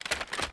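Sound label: Inspect Movement; Valorant; cstrike; marshall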